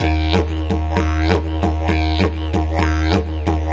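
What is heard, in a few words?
didgeridoo rhythm; single samples; World Music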